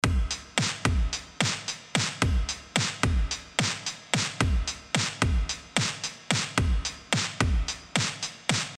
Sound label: Drums After; SuperVHS